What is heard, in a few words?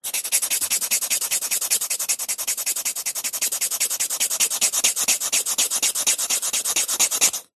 foley wood thin strips rub together fast trying to light fire; zapsplat